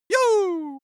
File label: cartoon male jump prepared